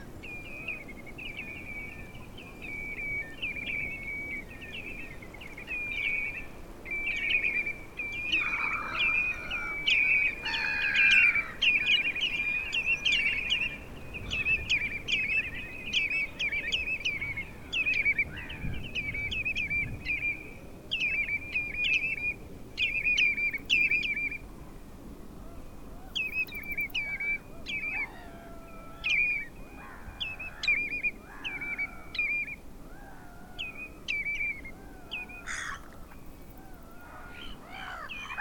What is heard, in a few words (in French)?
Chevalier gambette Tringa totanus